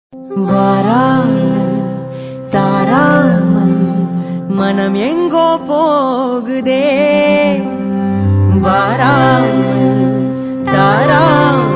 movie bgm ringtone; varshangalkku shesham

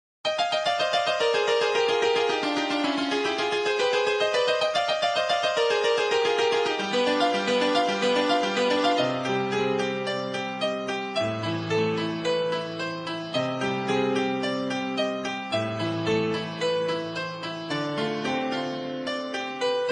ringtone melody bgm; mounaragam

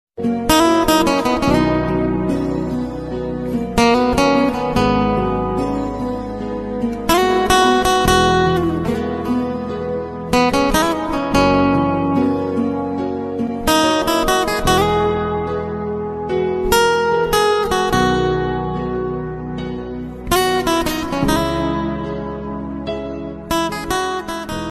instrumental ringtone music

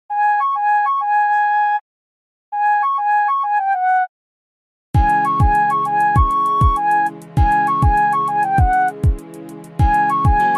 bike bgm ringtone; polladhavan; dhanush

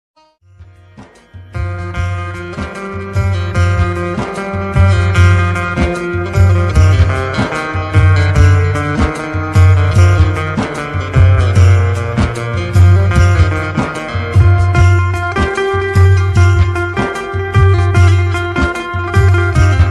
desh bhakti ringtone, hindi ringtone

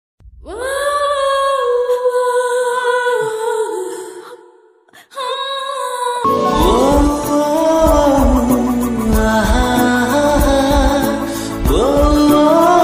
south movie love ringtone bgm, sad bgm; googly

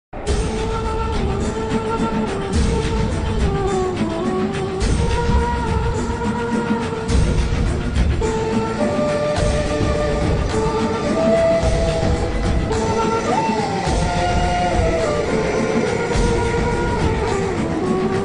radha krishna flute ringtone; radha krishan serial title song; star bharat

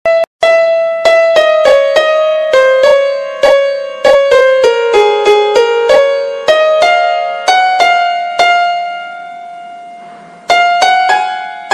ringtone instrumental ringtone; 15 august

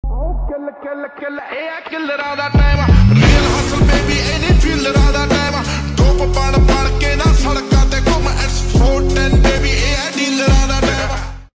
punjabi song; black screen lyrics whatsapp status

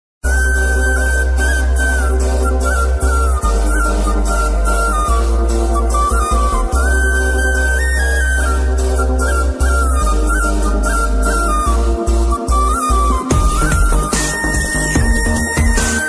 new love bgm ringtone; bruce lee; ram charan; rakul preet singh

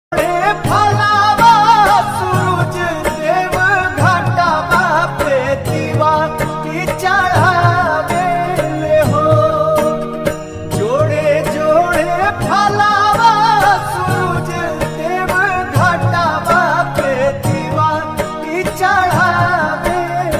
chhath puja ringtone; suraj dev

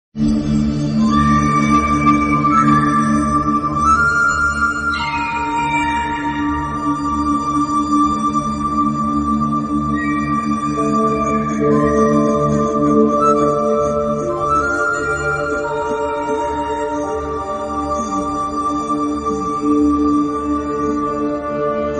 flute bgm ringtone music; karthikeya 2

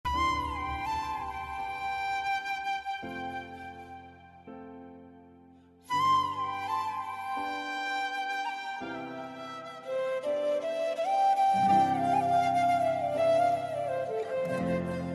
beautiful heart touching bgm feel good music; hi nanna

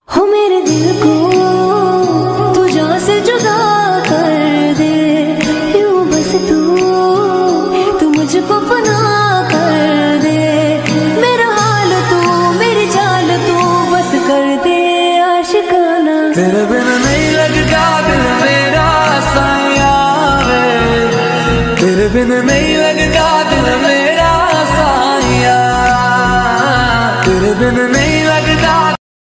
bhakti ringtone

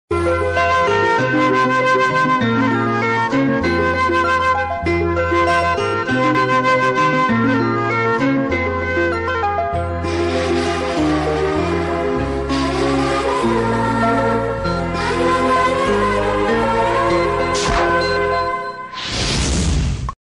love bgm south indian ringtones flute bgms

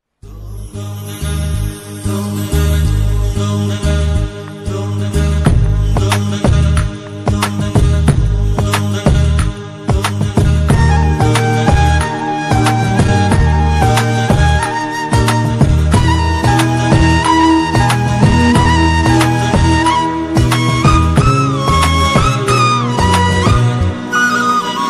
kannada movie flute bgm ringtone; ambari

